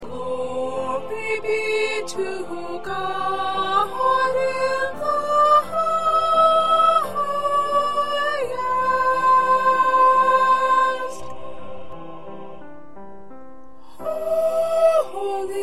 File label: 2nd Soprano